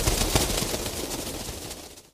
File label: Bats Buzz